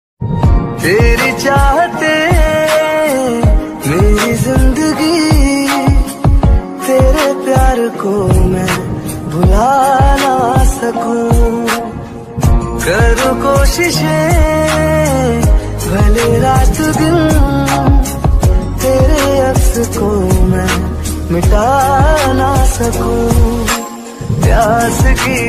best ringtone romantic ringtone popular flute instrumental ringtone